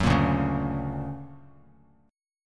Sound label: D Augmented Major 7th down Bass Standard; D+M7 strummed; JGuitar